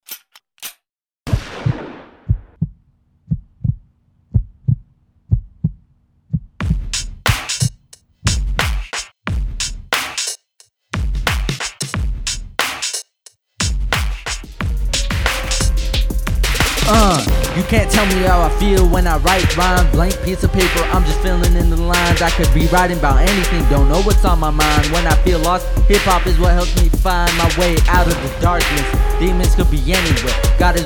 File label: Good Music